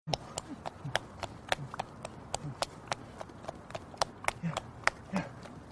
flap flap

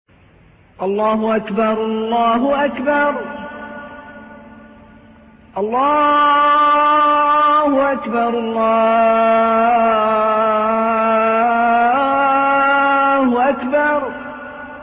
azan

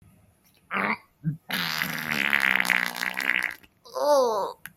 oh my fart

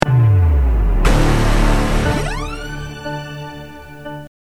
whoosh; sonic anthem

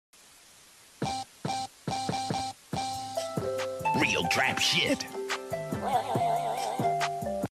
analog horror

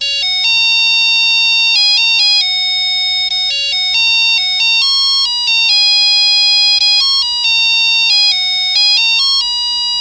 atari flashback bootup

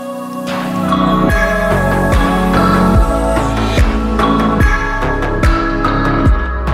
instrumental

